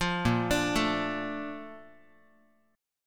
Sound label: A sharp Major 7th, Guitar; arpeggiated